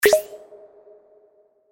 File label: notification livechat